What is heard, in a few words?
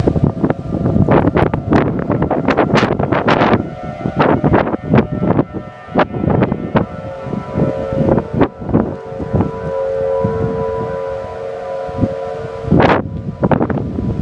tornado sirens